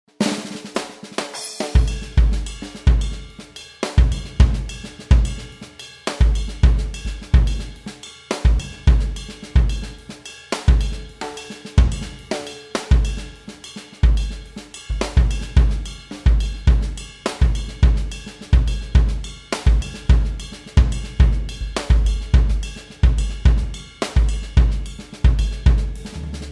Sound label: Songo